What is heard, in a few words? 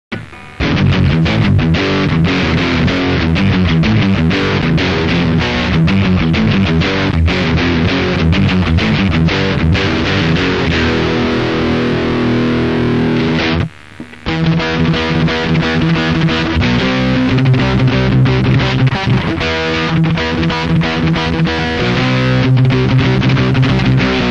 MJCM FT heavy